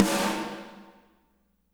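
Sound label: reverb snare, WET WHACKER; Spectrasonics; Burning Grooves CD2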